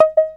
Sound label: two hit, Electronic Hits